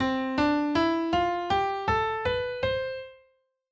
Major Scale C

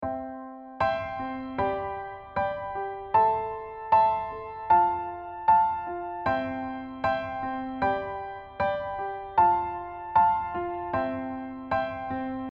range high, accompaniment range

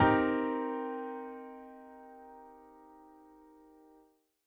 tetrad min7, chordtype tetrad

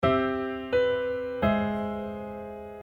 diatonic

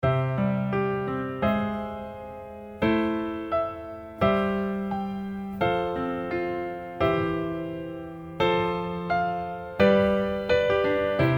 broken arrange ALL

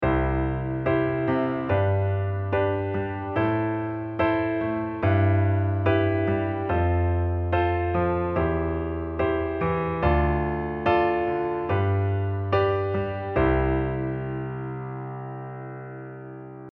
LH octave, accompaniment pattern

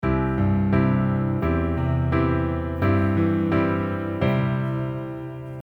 Bs Inversion

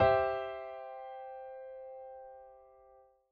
inversion C 2nd

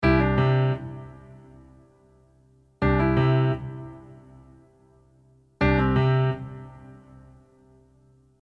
add9 from tips LH; accompaniment technic

